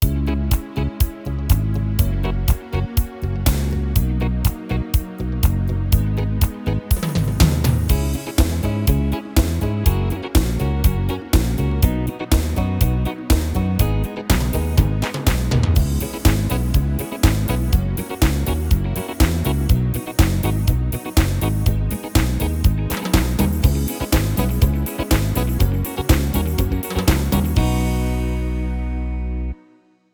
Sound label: style entertainer disco hands, yamaha tyros3